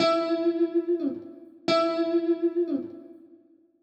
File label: DEEPHOUSE GUITAR 125 A